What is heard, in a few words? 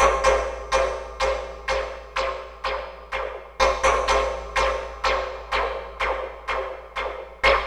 Panning Synth Hit; Sound FX